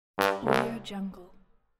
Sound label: cartoon trombone fail